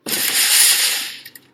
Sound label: sliding sound